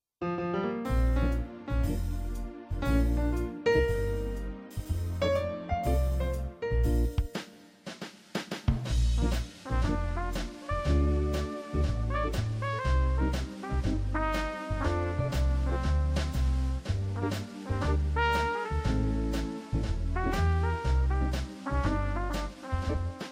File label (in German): Swing Combo